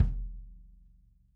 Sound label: kick